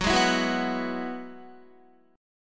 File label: Gb 7th Suspended 2nd Suspended 4th Gb down Guitar; Gb7sus2sus4 strummed; 4E,4A,5D,5G,5C,6F